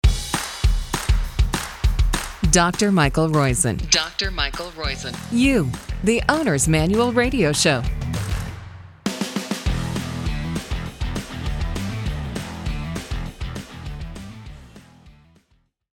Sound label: intro rock bed